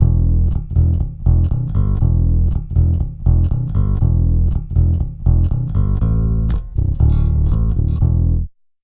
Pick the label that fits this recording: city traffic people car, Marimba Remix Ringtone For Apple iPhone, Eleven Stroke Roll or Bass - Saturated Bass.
Bass - Saturated Bass